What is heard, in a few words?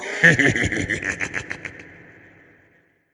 joker replaces; Demon Jack 27; Wazdan